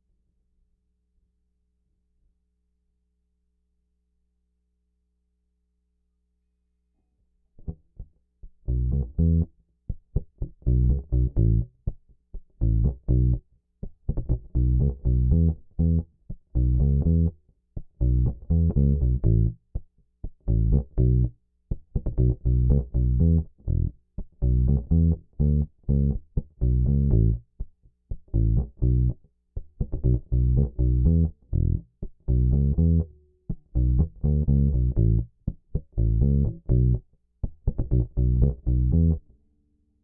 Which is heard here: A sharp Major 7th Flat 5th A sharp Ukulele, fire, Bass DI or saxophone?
Bass DI